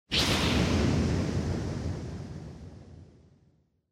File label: Motion Speed Lines Mid